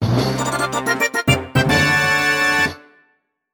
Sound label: Boss Clear Fanfare; New Super Mario Bros.